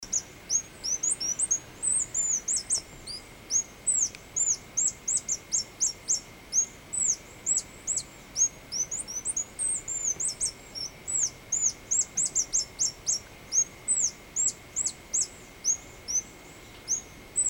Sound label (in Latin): Phaethornis ruber; Picinguaba; Ubatuba; SP; Silveira